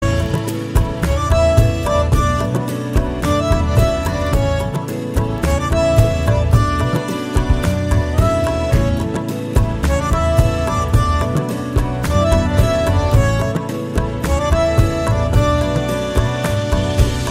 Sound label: Instrumental Ringtone